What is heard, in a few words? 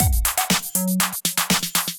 groove 120 bpm; Sensor Kit